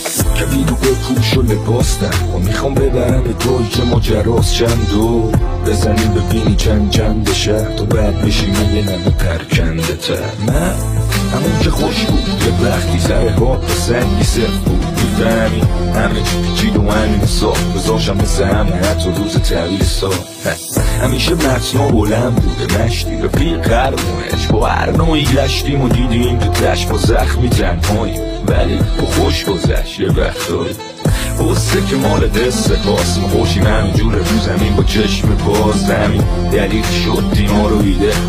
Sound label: remix rap